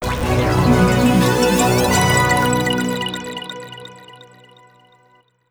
Mission Sting Win; fat city

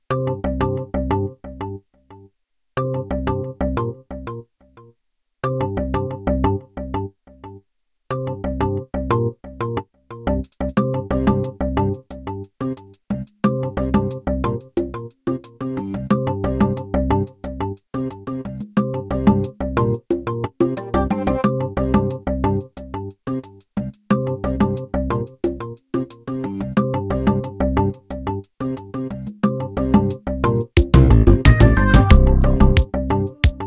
Loading Amb; pirate hunter windows gamerip 2009

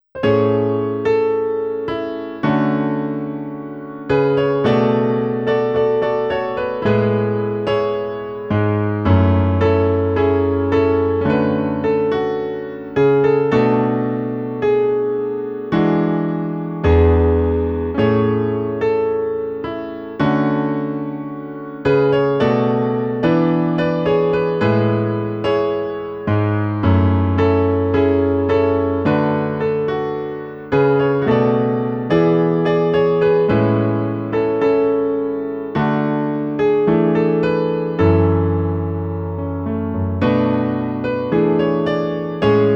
(Piano Ver.)

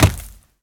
stomp dirt; Scrat

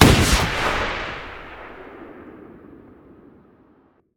RPG Fire alt

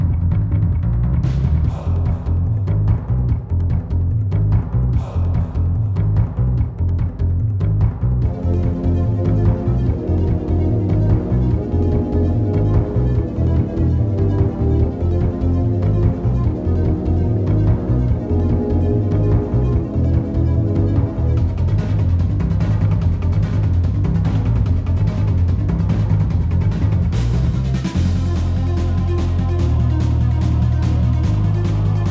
Boss Music; primal light linux macos switch windows xbox one xbox series xs gamerip 2020

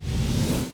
mage lord strike prepare; Hollow Knight

